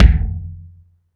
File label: BD ROOM; Best Service ProSamples vol.54; AKAI